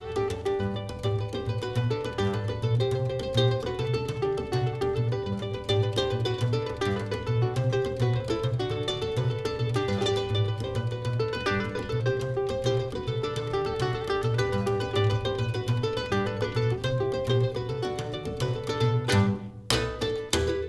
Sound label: Brazilian Tune, musicbox